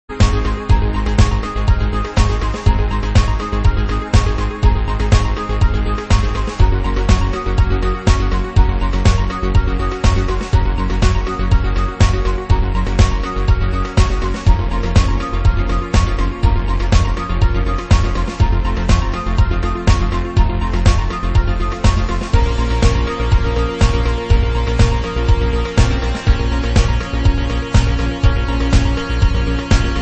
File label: SID Remake; Parallax